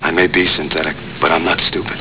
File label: synth; "I may be synthetic but I'm not stupid"